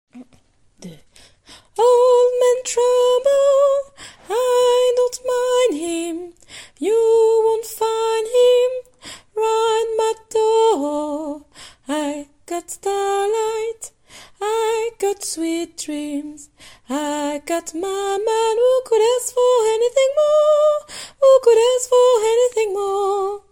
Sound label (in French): soprane